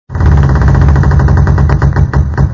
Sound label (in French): craquements bateau